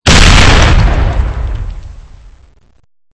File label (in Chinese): 气瓶爆炸声; LaboratoryProtection